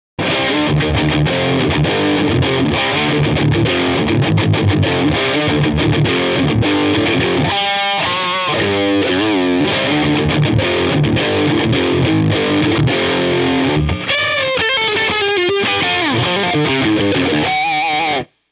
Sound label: Distorsion, pedales; DS830; Distorsion Master